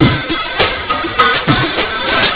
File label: jazz weird